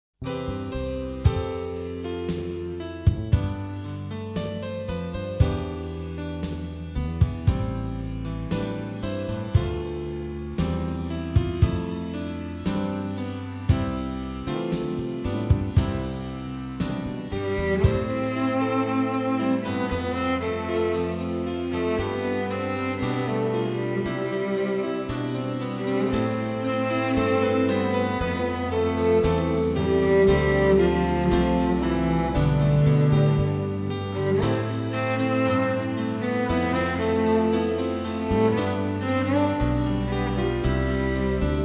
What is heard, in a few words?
Cello; AmbienceCello